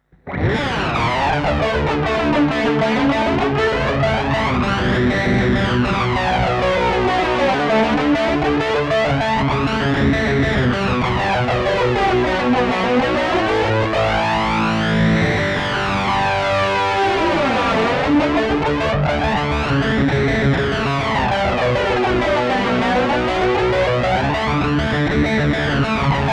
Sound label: Flanger